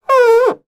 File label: household finger squeak rub on polished table